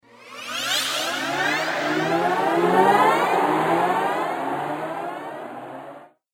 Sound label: Out Of Body Flashback Whoosh